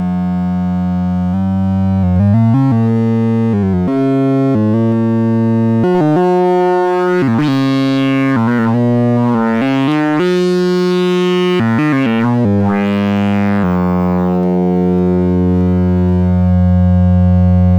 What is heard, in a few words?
cyclebox sinepulse1 tablesawsweep